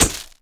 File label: bulb flash